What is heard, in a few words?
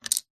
leisure lego piece single set down on wood table